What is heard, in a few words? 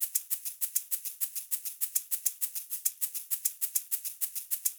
Cuban D, перкуссия